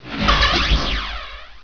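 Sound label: teleport; HEXEN2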